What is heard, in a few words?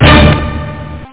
orchestra hit